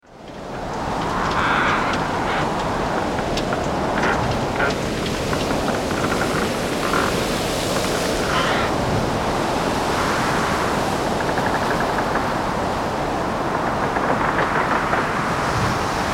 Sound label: Boat Storm